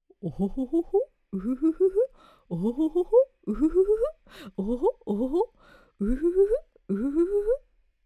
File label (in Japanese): uragoe